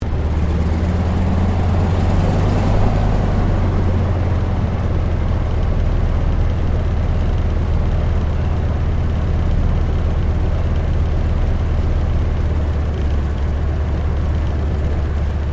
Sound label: AIRCRAFT CAC WIRRAWAY SHUTDOWN INTERIOR; CA-16 Wirraway; Airplanes; Vehicles